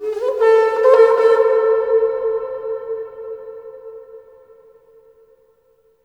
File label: HRNPHR, Heart of Africa; AMB. HRN FX